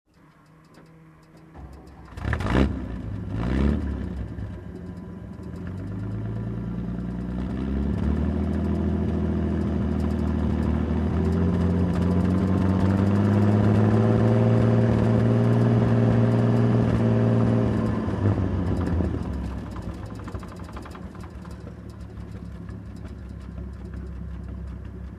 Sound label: Driving around in military truck